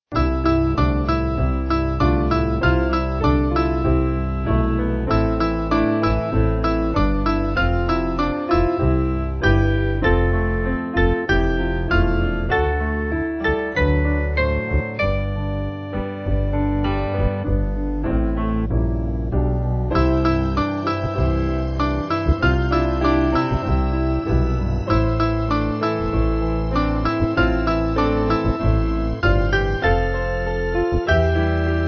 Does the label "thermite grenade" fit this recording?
No